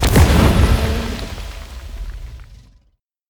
deep breath

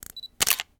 camera snapshot

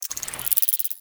liquid metal

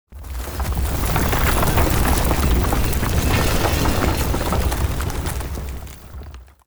collapse

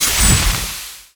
sleep

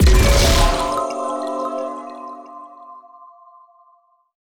nightbox hit

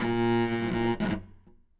cello interrupt

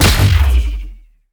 punch

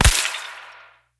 p atk darkflute

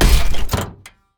wrench

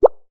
bubble cartoon

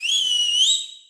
whistle long

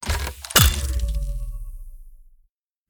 atk bucket gun

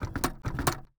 robot walk

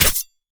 knife

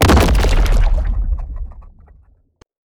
lantern soul end

